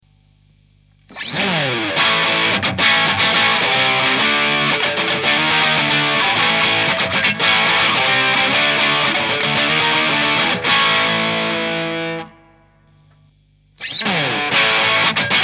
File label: kitty guitar